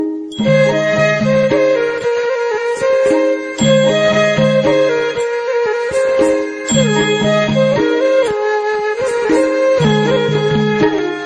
Nepali

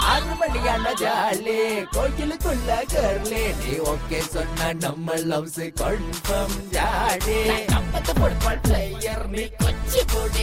Rap Song

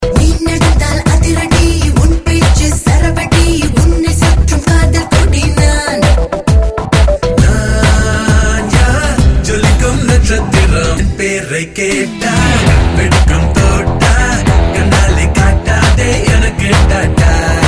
Tone

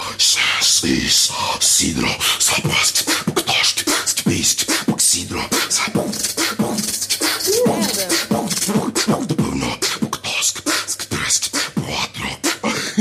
Beat Box

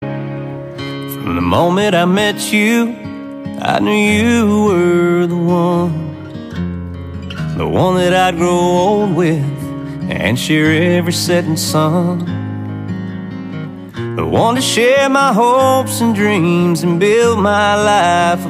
wedding song

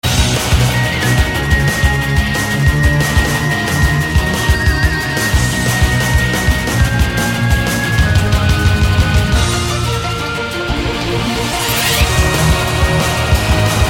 hardstyle

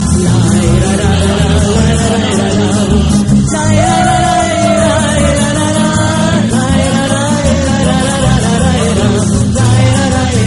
Gipsy Message

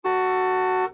Porsche horn; NFS

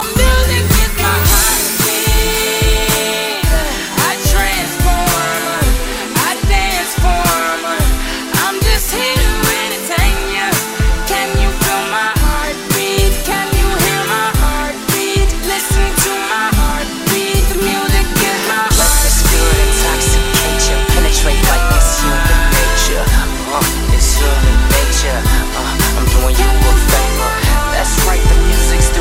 Heart Beat

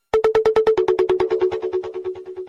New Incoming 3d Tone

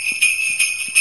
Sleigh Bell Text

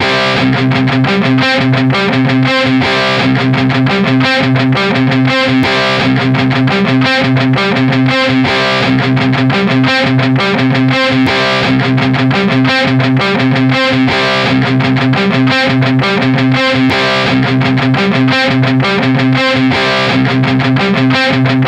Rocking Guitar

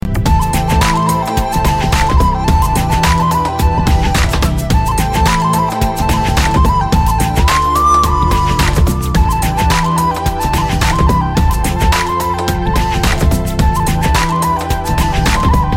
flute fast music

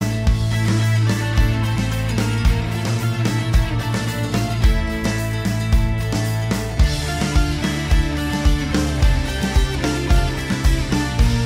ROCK; Ertugrul

